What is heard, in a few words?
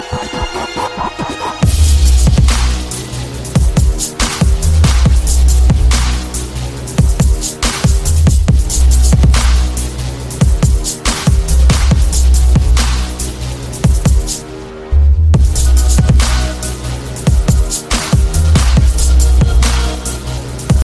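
bass rb hip hop ringtone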